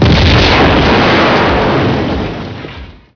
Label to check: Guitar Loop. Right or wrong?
Wrong